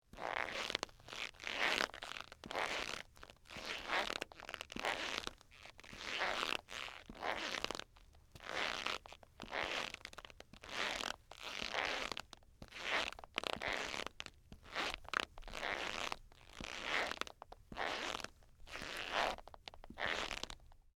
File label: Squeaky Shoes Walk, Footsteps; BlastWave